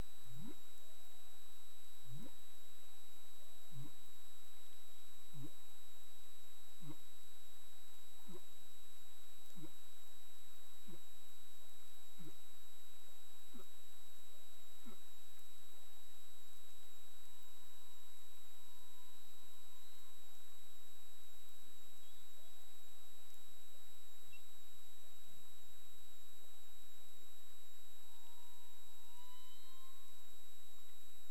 humpback whale; CARIMAM

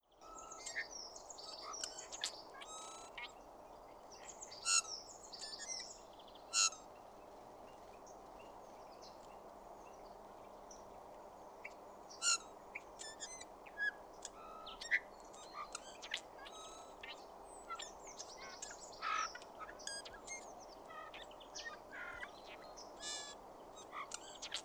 Pyrrhula pyrrhula; SETE

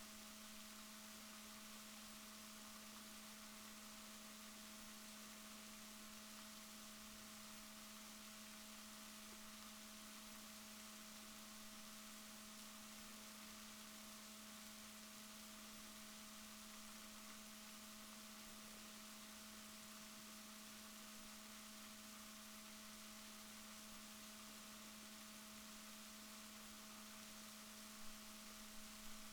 rorquals positif, Balaenoptera Physalus Chile

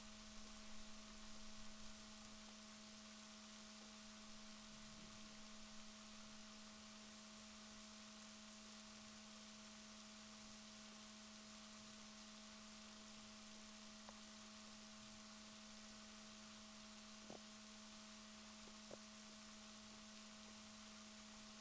rorquals negatif, Balaenoptera Physalus Chile

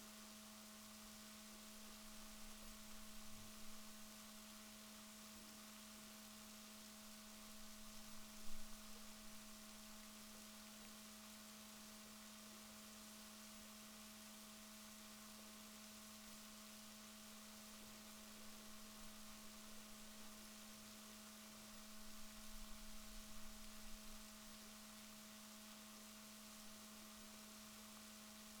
rorquals negatif, Balaenoptera Physalus Chile

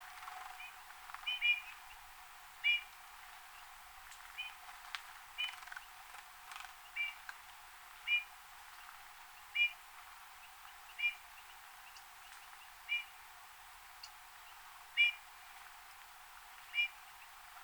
Pyrrhula pyrrhula; SETE

